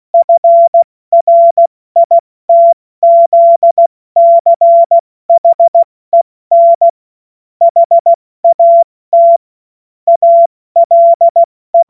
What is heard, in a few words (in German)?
Witz, Morsekurs, 70 F80; Witze